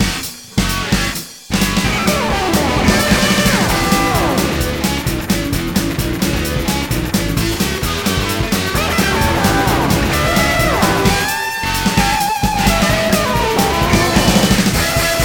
Bonus Bgm; 18 Wheeler American Pro Trucker

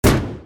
Fire; The Henry Stickmin Collection

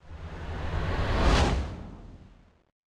Aoe Riser

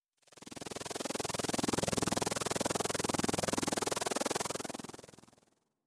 Radiant Drone; Engineer

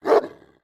Dog St Bernard; unsighted

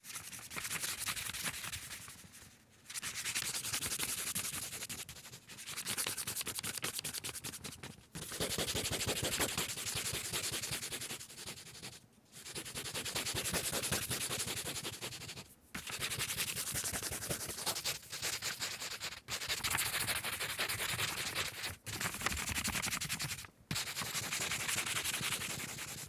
Scribbling Loop

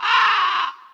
Crow; Crow Pattern V O2

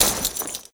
Skell Soldier Cloth

